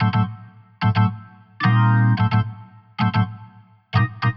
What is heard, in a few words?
Jazz Keys Organ 110 A, Chordy Loops, 110bpm